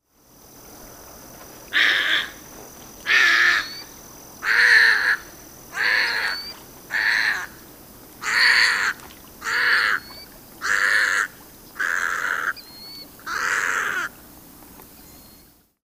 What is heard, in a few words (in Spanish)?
Egretta caerulea Garceta azul